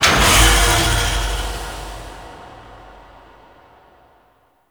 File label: Dark Machinery Air Burst; Warframe; Tau